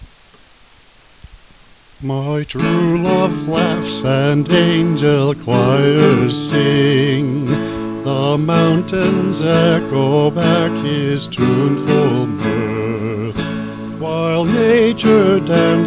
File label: my true love laughs vocal; three sonnets in an antiquated style